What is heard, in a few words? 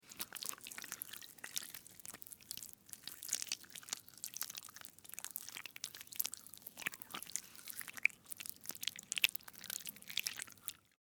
Worm, Crawl, Movement, Slimy, Wet, Insect, Bug; Animals:Insects; Worms; Blastwave